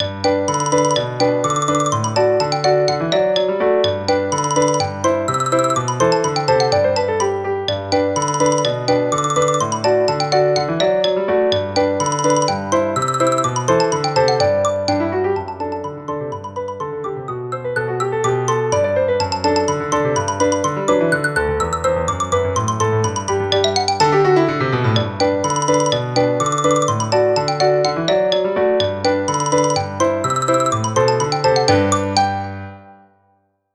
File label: Xylophone Polka